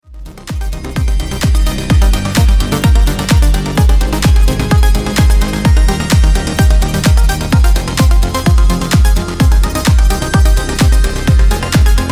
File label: Synth Pop Mix WET